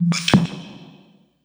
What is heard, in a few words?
DSP Hit